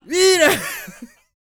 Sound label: LAUGHS; Spectrasonics; Vocal Planet; Jazz FX